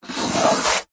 wood smooth hand fingernail rub

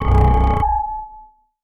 Tractor; martyn stonehouse

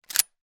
weapon gun; charles daly; still north media

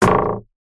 sport diving board bounce wobble; zapsplat

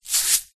cake decorations hundreds thousands tube shake; food

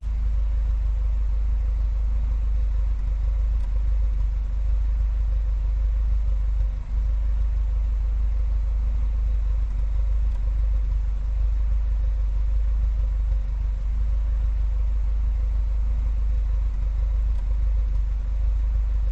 EXT engine idle exhaust; detunized

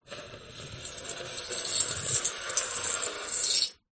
foley metal scrape sharp squeak shrill med

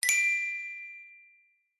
fantasy magic wand ping bright; zapsplat

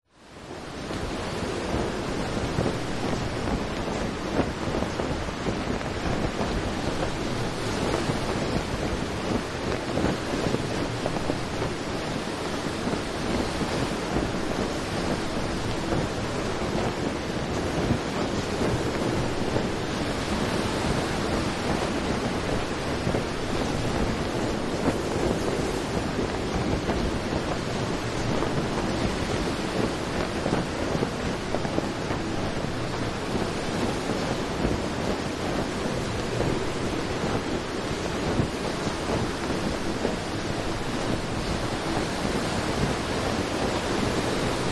boat yacht sailing boat sail windy rough storm; vehicles; zapsplat

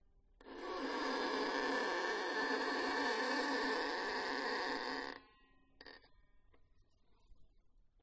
violin long bows condenser; aston origin

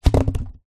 polystyrene expanded block place down on ground; foley

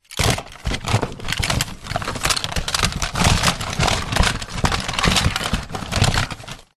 leisure toys rummage box

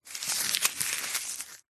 foley paper ticket screwed up handling; zapsplat

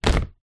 tub storage large plastic lid down; foley

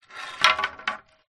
boat wooden oars x2 old down on hard ground

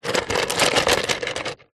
foley stones small rummage plastic pot; zapsplat

